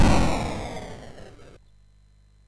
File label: MOS6581R2, sid; Defenders of the Earth